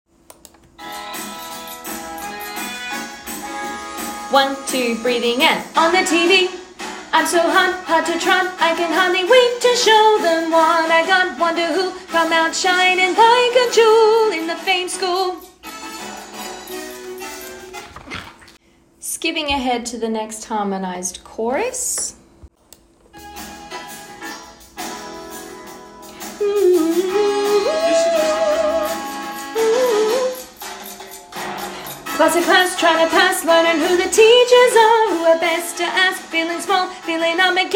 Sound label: Alto; Fame The Musical - Holiday Pack